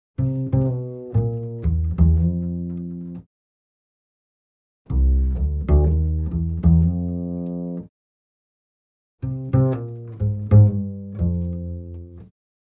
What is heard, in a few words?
bindungen slurs